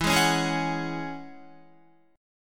E 6th Add 9th E down Guitar; E6add9 strummed; JGuitar

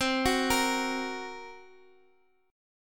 A sharp Suspended 2nd Sharp 5th

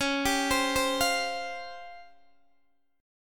C sharp Major 7th Flat 5th Guitar Open D

